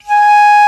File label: Flute p G#3; Digital Sound Factory Virtuoso